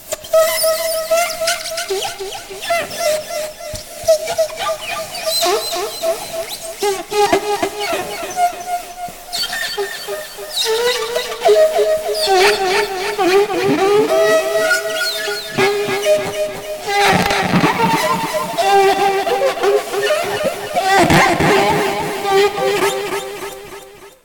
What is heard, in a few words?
mental attack; horror